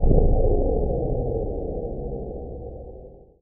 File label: SPACE FX 140; SpaceSounds